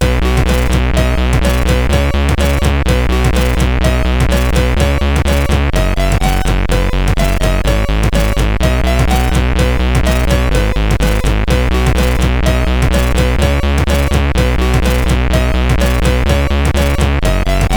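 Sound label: Xmas Rock, MOS8580R5 sid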